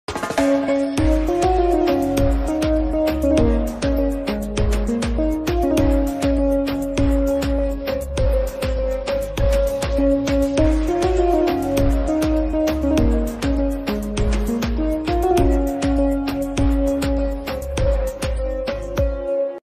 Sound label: piano flute ringtone, instrumental ringtone